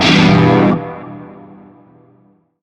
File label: Shawzin Maj Chord; Music; Warframe; Narmer